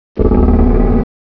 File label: ambi, jungle; gearbox